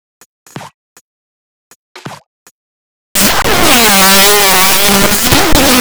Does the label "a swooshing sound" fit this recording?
No